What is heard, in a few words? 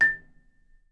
A5, Percussion & Harp; Miroslav Vitous - Percussion & Harp